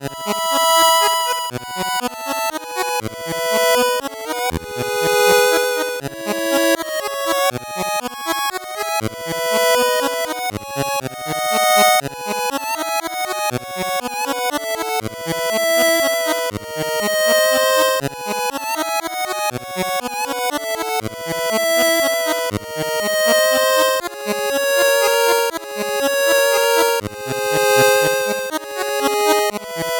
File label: 8bit bgm fast